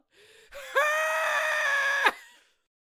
Attack Vocals; Blackbeard

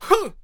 Catapult Death Vocals

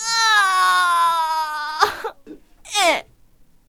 Radiant Glaive Death Vocals

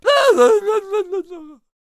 Banner Bearer Death Vocals

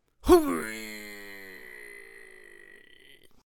Death Vocals; Balloon Archer

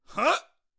Teacher Attack Vocals

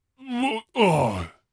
Void Monarch Death Vocals

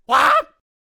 Monkey King Attack Vocals

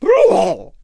Bard Death Vocals

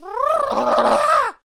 Poacher Death Vocals

